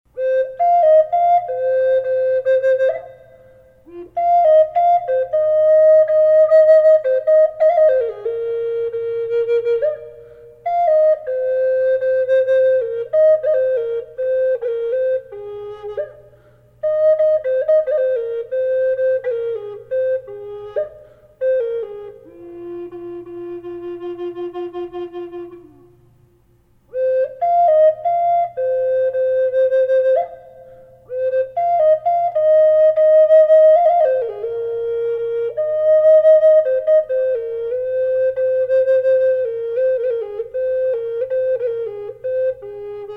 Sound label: bocote reverb raven f minor, flutes; collector flutes